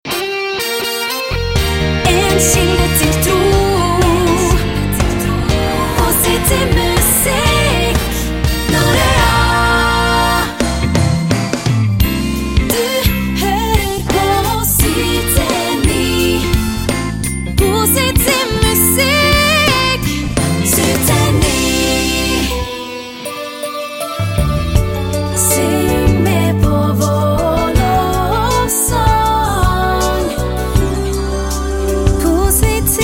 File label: Jingles; ReelWorld; Norea